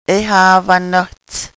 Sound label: loud footsteps